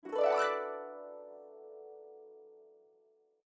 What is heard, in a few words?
Win; lunas wandering stars windows gamerip 2015